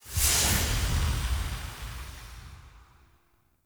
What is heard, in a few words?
Forcefield Border End; Objects